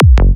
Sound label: Psy Trance Kick Bass Drum Loop 170bpm With Strong Attack Phase